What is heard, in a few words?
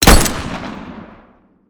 Weapon fire single pistol